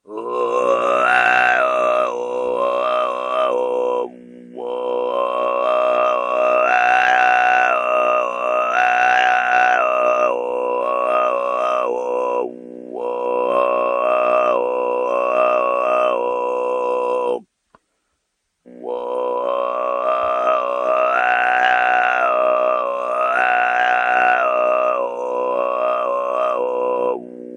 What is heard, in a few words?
tuva overtone singing